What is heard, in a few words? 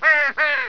CROW; animal